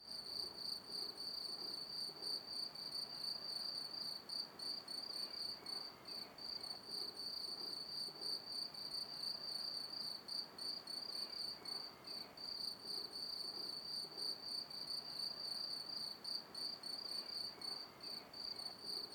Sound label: Rural ambience, cicadas, bird songs; Sound Ex Machina